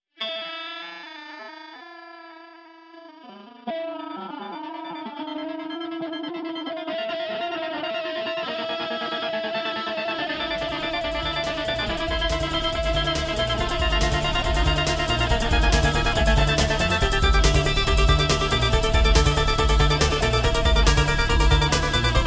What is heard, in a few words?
Electro